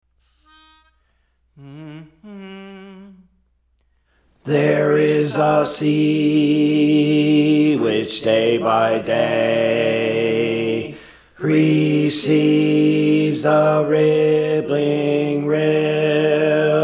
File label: Alto; Praise For The Lord Training